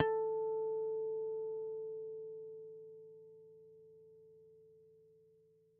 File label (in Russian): chistie garmoniki elektrogitari